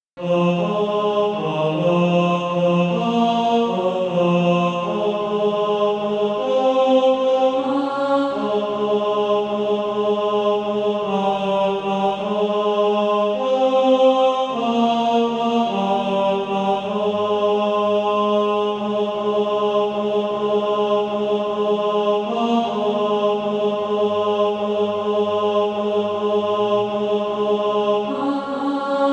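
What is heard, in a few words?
tenor, Sheet music (SATB) including Practise Music; Crown Him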